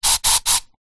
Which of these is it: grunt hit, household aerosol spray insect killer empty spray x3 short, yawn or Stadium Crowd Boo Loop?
household aerosol spray insect killer empty spray x3 short